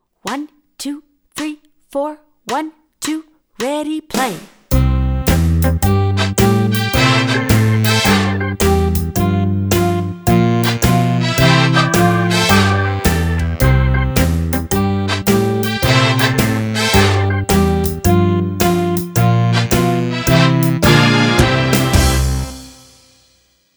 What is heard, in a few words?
Happy Exercise Dance; My First Piano Adventure Book B